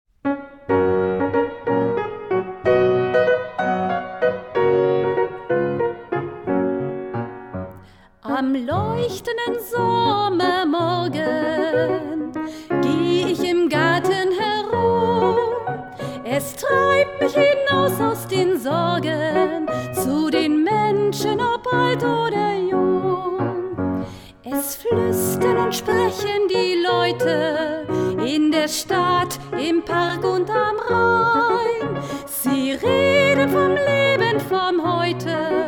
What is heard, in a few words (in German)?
komplett mit Gesang; Rheinstadt